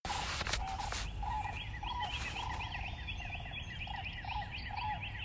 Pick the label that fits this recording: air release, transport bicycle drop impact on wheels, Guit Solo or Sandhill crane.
Sandhill crane